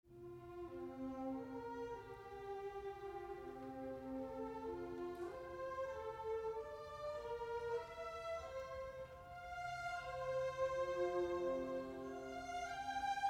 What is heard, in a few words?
Fugatto